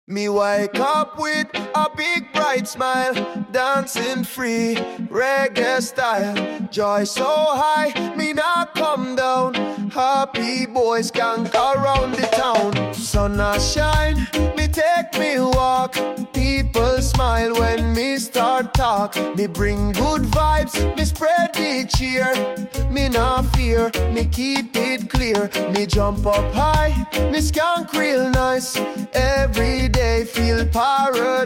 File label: Happy Boy Skank